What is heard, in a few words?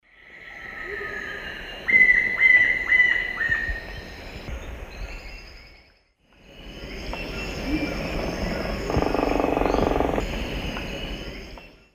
Celeus flavus, call and drum; S. America; Eastern Lowlands